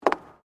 FOOTSTEPS WOMENS HIGH HEELS BOARDWALK STEP

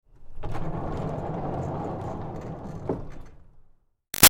Door Barn Rolling Light Roll; Rattley; Barn Doors

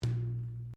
Wooden Column Impact Fist

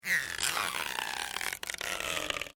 Cartoon Rubber Band Stretch; Cartoons; Miscellaneous Cartoon

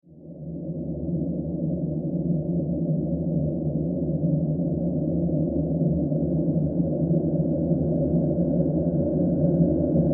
Horror Drone Airy Steady Wind; Evil

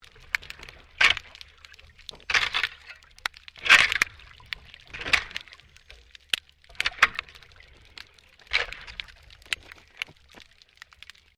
MAINTAINENCE WORK UNDERWATER SHOVEL SCRAPING; Maintainence divers off StKilda Pier; Construction

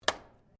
SWITCH LIGHT OFF, Sounds onboard HMAS Castlemaine; Boat Sound Effects; Boats (Sound Effects:Vehicles)

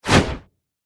Cartoon Dragon Wing Flap; Monster Sound Effects

